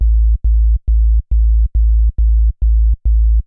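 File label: SUB bass drum, Techno 138 BPM; KOELN; Best Service ProSamples vol.54; AKAI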